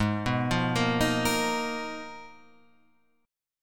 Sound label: Ab Minor 6th Add 9th, Ab, Guitar, Open F; arpeggiated; JGuitar